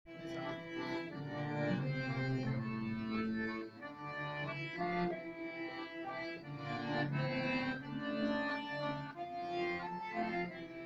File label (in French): Violoncelle; A et B